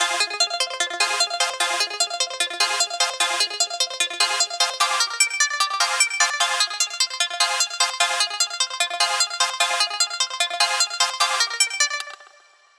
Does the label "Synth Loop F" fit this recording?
Yes